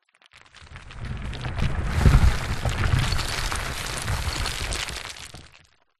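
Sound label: rocks big and small and debris short landslide slip; foley; zapsplat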